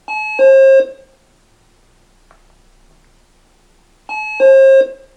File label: bip